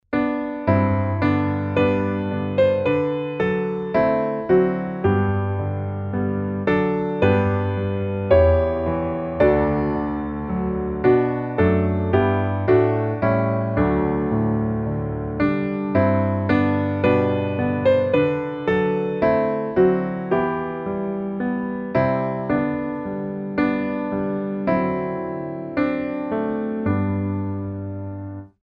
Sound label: piano